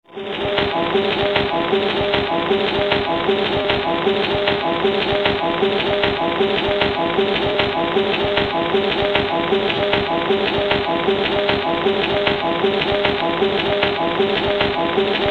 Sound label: Lead Out; lead-out groove; has what sounds like a few notes from a piano here